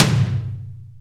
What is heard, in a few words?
Tom, Percussion Hits; Zero-G; Soundclash; Acidized Wavs